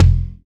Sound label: BD, BD Dance